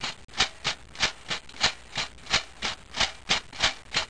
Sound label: Flour Sifter